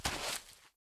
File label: walk, turtle; mob; minecraft